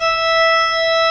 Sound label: BP E 5, ORGAN